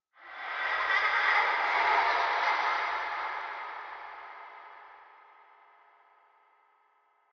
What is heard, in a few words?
Creepy Giggle